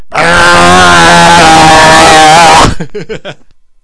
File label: SCREAM